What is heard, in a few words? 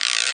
GUIRO, Ensoniq ZR-76; 300 Drum Machines